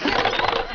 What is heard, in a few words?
pull